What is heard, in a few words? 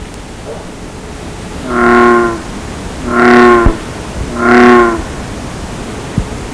bullfrog; frogs